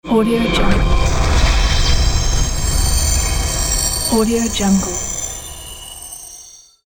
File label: rumble hit